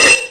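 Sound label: percussion FX, Dance Drums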